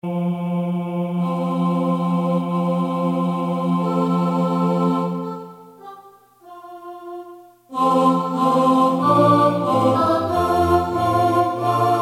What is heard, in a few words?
ALTO